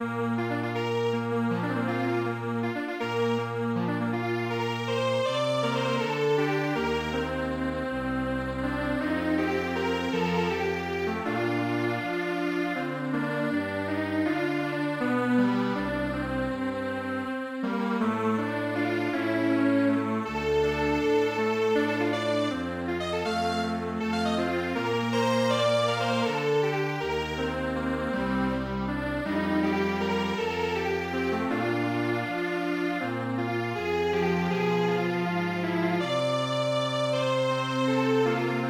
Strings Quartet; Mattinata

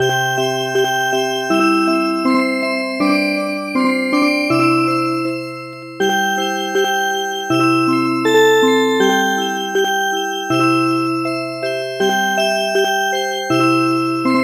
Music Box; Chick

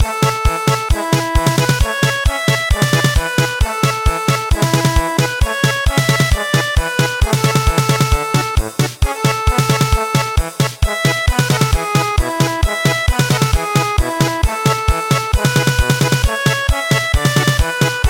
German Polka; traditional; Japanese March

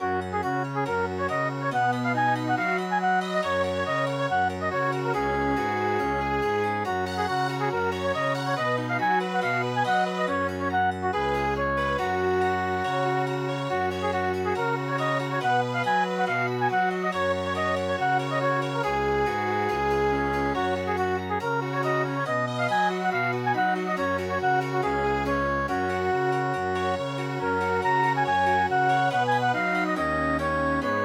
Chamber Music; Humoresque

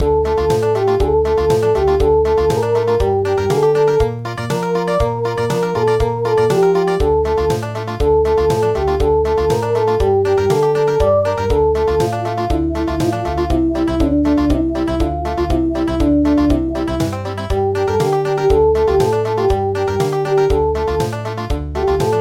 Country

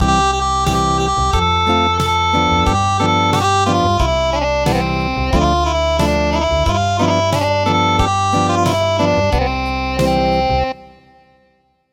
Acoustic; Goodnight Song for Dobbin

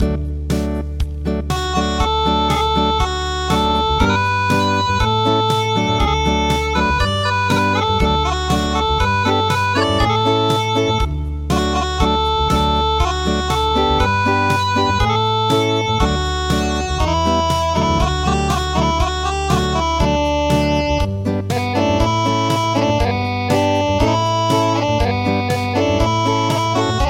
Acoustic

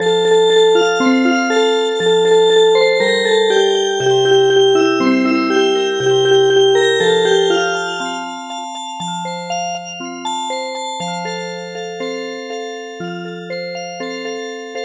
Music Box

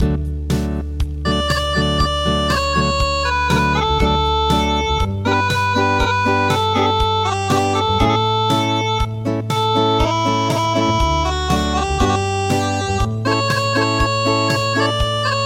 Acoustic, midi

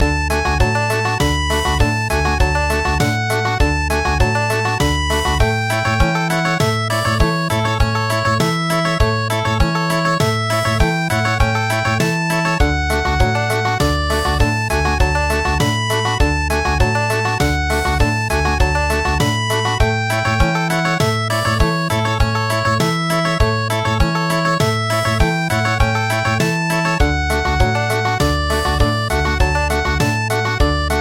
Country; traditional; Oberek

